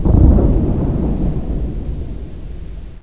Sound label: distant mortar